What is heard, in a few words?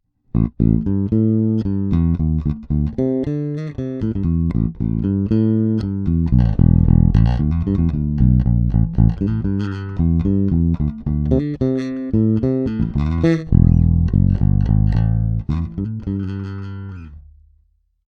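JB2 Fingerstyle Both Pickups; GL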